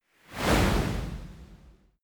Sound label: whoosh